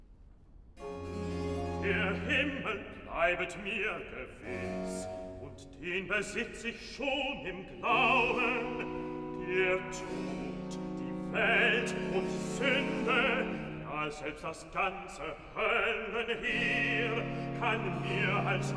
Cantata, Recitativo; BWV 64 'Sehet, welch eine Liebe hat uns der Vater erzeiget'; Bach; New York; 3rd Day of Xmas

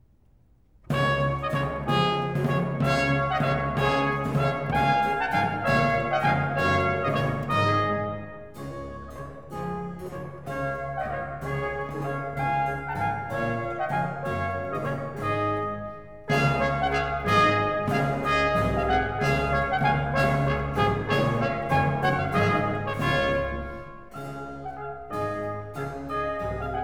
Trumpet Tune; Dioclesian, Act IV

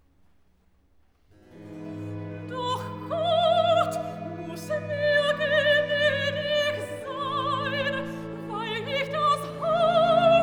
Cantata, Recitative (soprano); Cantata BWV 199; Doch Gott muss mir genädig sei; Johann Sebastian Bach; Gardiner

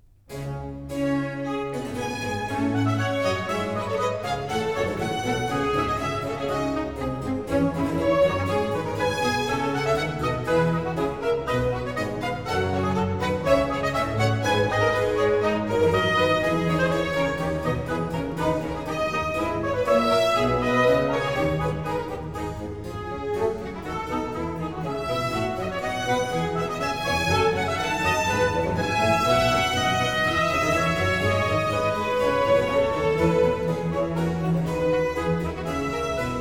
Concerto Grosso in D minor, Allegro ma non troppo; Georg Friedrich Händel; English Baroque Soloists, Sir John Eliot Gardiner; Concerti Grossi, Op. 3

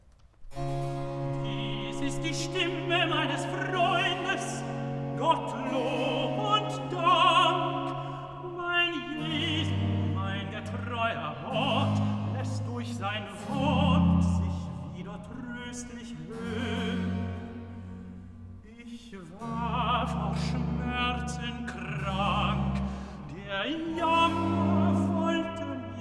Cantata, Recitativo (Tenor); BWV 154 'Mein Liebster Jesus ist verloren'; Bach; Hamburg; 1st Sun After Epiphany

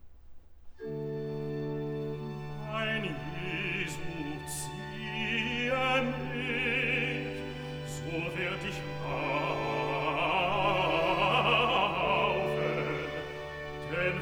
Cantata, Recitativo (Bass); BWV 22 'Jesus nahm zu sich die Zwölfe'; 'Mein Jesu, ziehe mich'; Bach; Cambridge; Quinquagesima

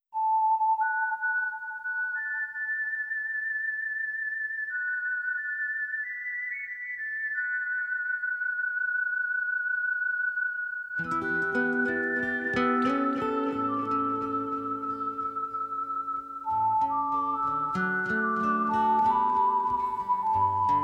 Whistle Theme

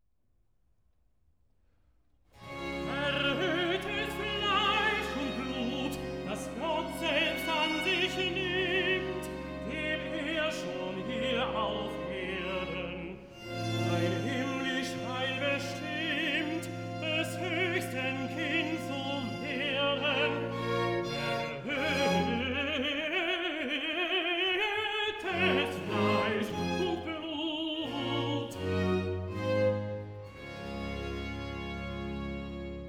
Cantata, Recitativo (Tenor); BWV 173 'Erhöhtes Fleisch und Blut'; Bach; Long Melford; Whit Mon

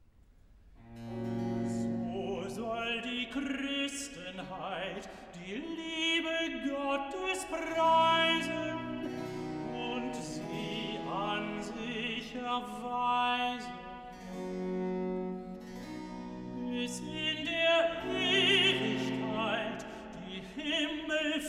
Cantata, Recitativo (Tenor; BWV 76 'Die Himmel erzählen die Ehre Gottes'; Bach; Paris; 2nd Sun After Trinity

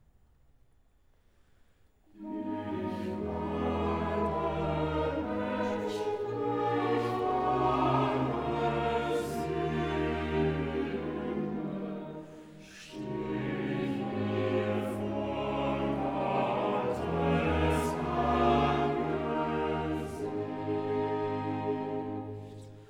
Chorale; Cantata BWV 179; Ich armer Mensch, ich armer Sünder; Johann Sebastian Bach; Gardiner

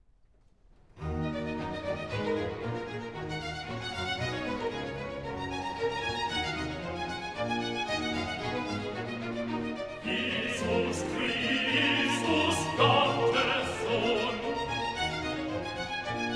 Tenor; Cantata, BWV 4 'Christ lag in Todesbanden'; Versus III; 'Jesus Christus, Gottes Sohn'; Bach; Eisenach; Easter Sun & Mon

